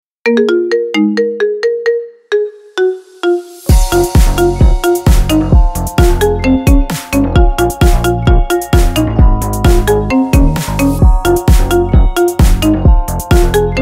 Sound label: Magnetic Marimba Ringtone